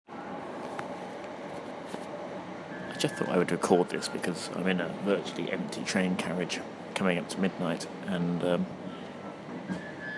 strange sounds aboard a late night train

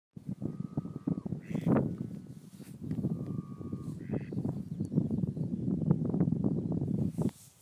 weird call

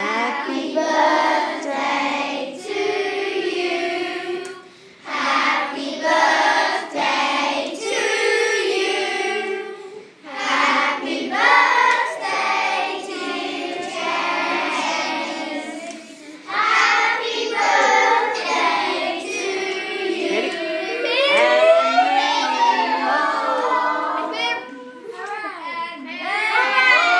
birthday song

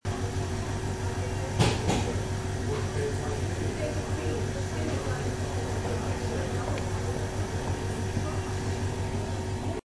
fridge

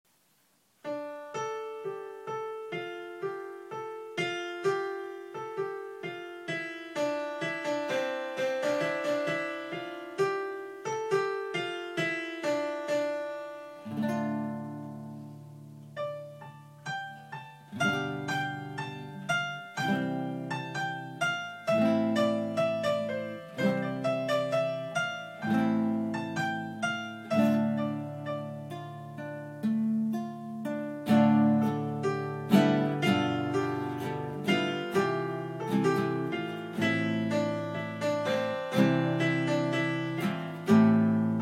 piano guitar cover

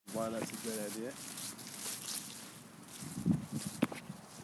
old man walking

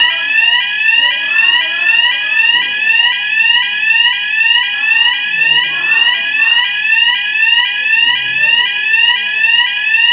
fire alarm

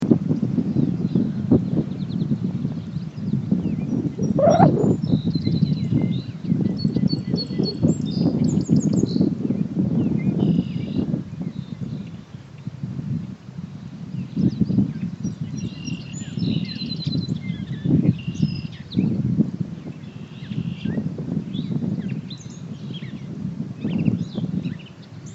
Shanksville wind and birdsong

